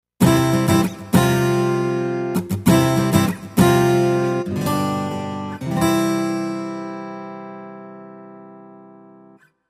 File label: Tyros Mega 12 String Gtr